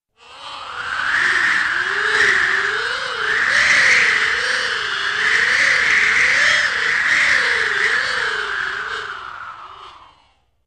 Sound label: Funny, Strong, Gusty Wind with Musical Gusts ( Wind Machine & Harmonica )